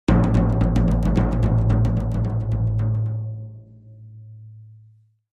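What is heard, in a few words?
Drums Toms C Low Stereo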